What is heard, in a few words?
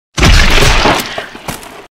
Bleeding